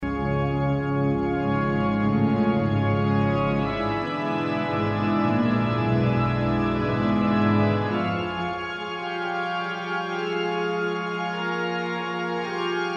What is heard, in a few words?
Improvisation